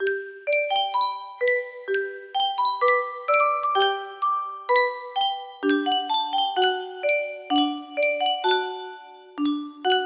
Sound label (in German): Yunsheng Spieluhr